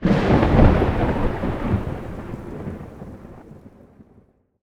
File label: lightning Middle; netent